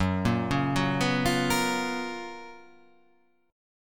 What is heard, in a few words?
F sharp Major 11th